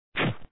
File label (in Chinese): 踢; Samurai vs Ninjas